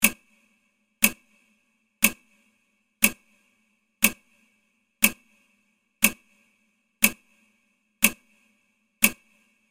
The sound of the hands of the clock moving

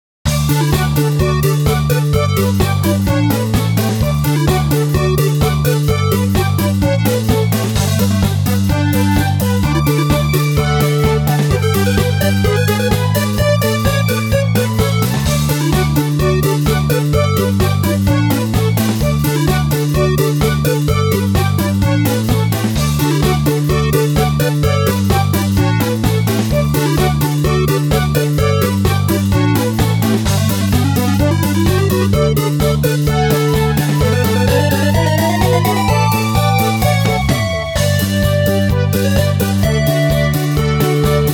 mini game competition, bgm

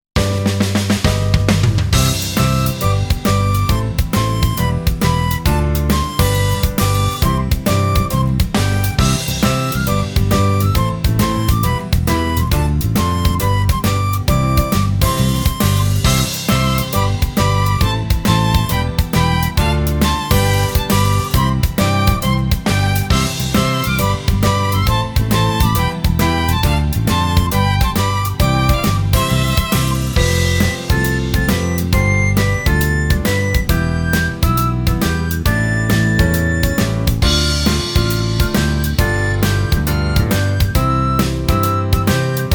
Holiday weather, bgm

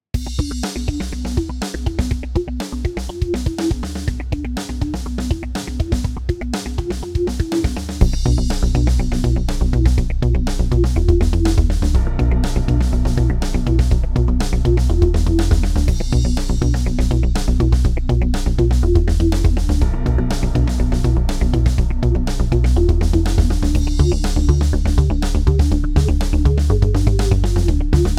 enemy central, bgm

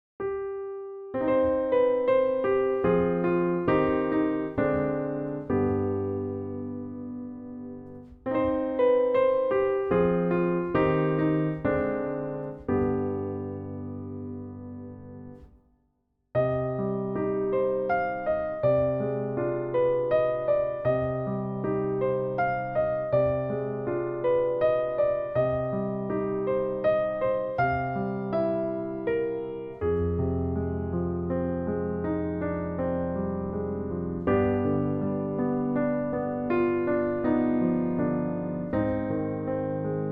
unstoppable rain

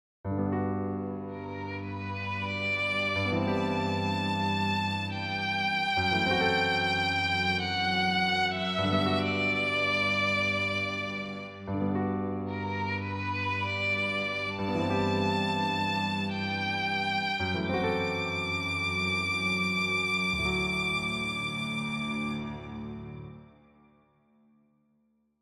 Time to flow slowly

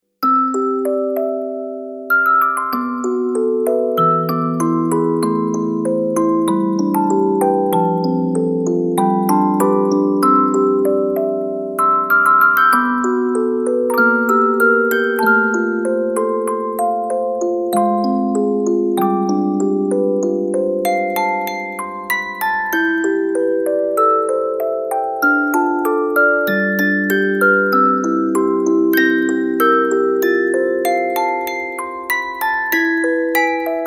The joy of meeting Music box

